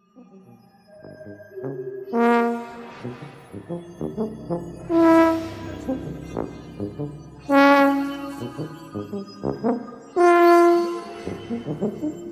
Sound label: tuba computer